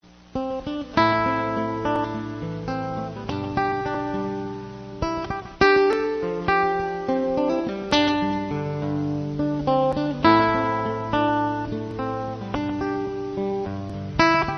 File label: romantic, electronic ringtones